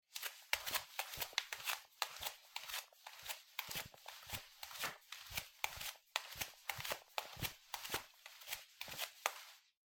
フローリングを裸足で歩く

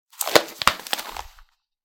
新聞紙を広げる音

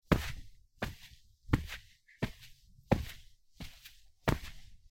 カーペットの上を歩く音

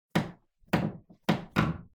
フローリングがきしむ音

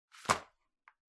ゴム手袋を置く音

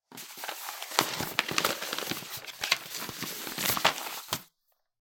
新聞紙を触る音

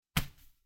スマホをシーツに投げる音

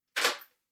玄関の鍵を閉める音

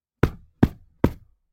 布をポンポン叩く音

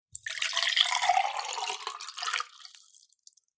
グラスに水を注ぐ音

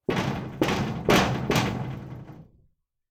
和室の扉をノックする音; door

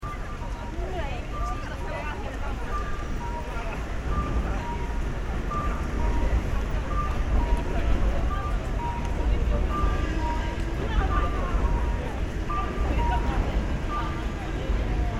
人が多い大きい横断歩道の環境音

